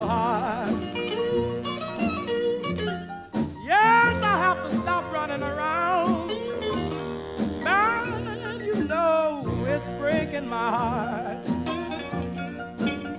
blues

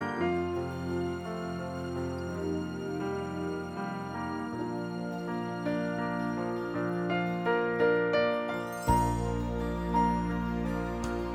piano

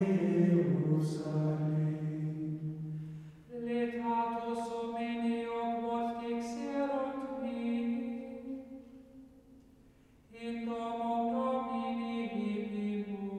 Chant

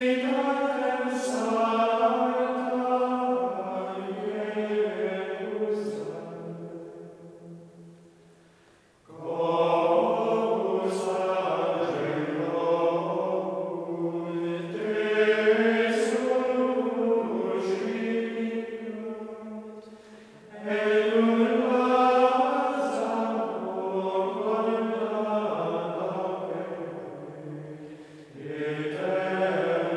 Gregorian Chant

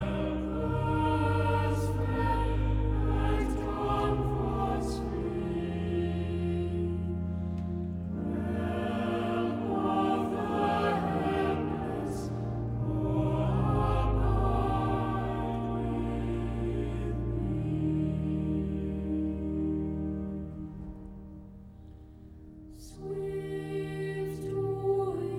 Choir; gemengd

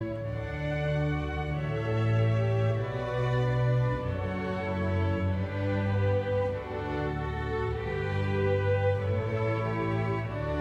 Canon in D; Pachelbel

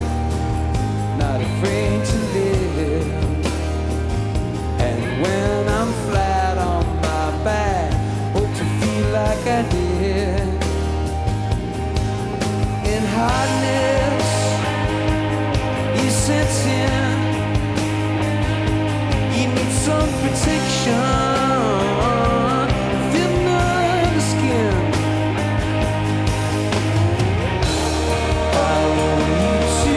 applause

